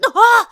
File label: Link Gasp; Link gasping (touching the Triforce or seeing Ravio unmask)